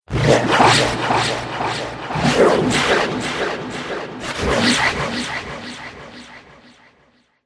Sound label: Alien Slurpy Atmospherics